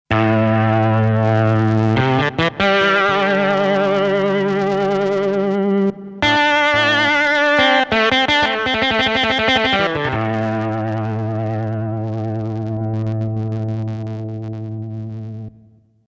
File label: Artiphon ORBA 2 Funky LEAD